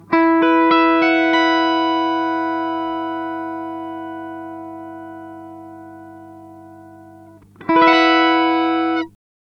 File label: Mooer Tender Octave X2 upper octave tone min